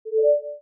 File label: accept sound